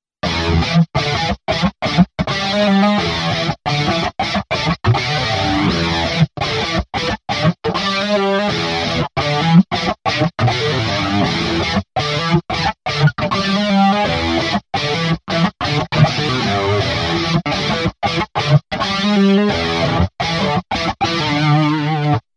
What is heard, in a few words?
Burn It Clean; Empress ZOIA Preset 25